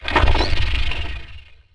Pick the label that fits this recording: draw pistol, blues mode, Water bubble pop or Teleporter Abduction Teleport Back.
Teleporter Abduction Teleport Back